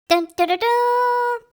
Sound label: EMOT CHEER